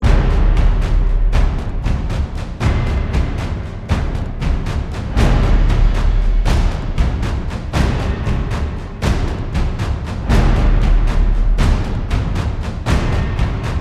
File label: Loop Drums Only; DLC Blue - DLC End Qunari Dungeon Meet The Bosses